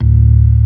ORG. D1; B3 FULL

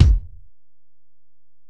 Ac BD, Alesis DM-PRO; 300 Drum Machines

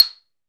MARIMBA C 5; AKAI S-Series CD-ROM Sound Library VOL-7; MINI MARIMBA

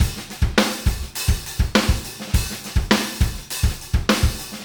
Beat Loops, 103; Zero-G; Monster Beats

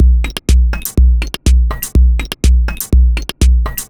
C 123bpm, House Drumloops; Zero-G House Fabrik Drumloops

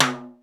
TIMBAL, PERCUSSION; Crypton - DrumBase5000

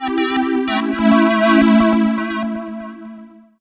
2 Step 133 SYN, House; USB Soundscan vol.51; House Side Of 2 Step; AKAI

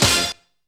ALRIGHT HIT, Funky Elements, HITS STABS; Zero-G

